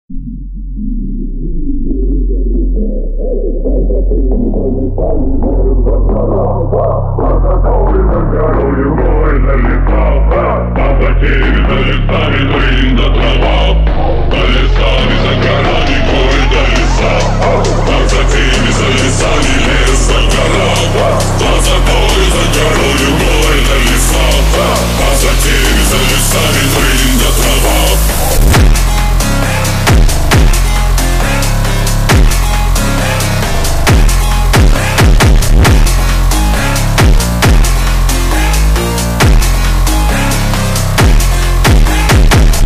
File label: phonk remix slowed reverb